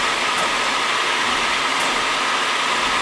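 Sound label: locomotive; loko; Mafie 1 cz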